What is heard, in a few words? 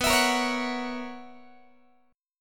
B Major 7th Suspended 2nd Suspended 4th, down, Guitar; strummed; x,19,19,20,17,17; 4A,4E,5A,5D,5G#,6C#; JGuitar